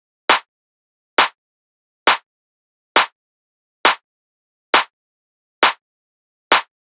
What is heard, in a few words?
Clap Loop 135, Techno; Drums; Ghosthack; Techno Sounds 2025